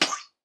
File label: squish